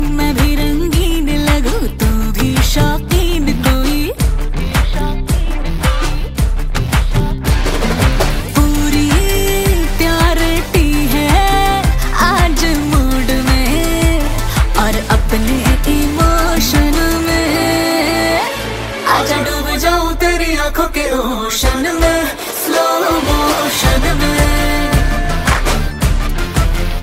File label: bollywood music ringtone